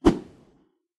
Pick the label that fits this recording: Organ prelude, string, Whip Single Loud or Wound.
Whip Single Loud